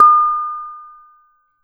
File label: Bonang P, Gamelan